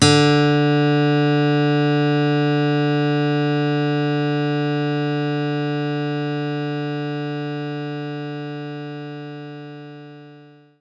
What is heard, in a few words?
Steel Guitar D4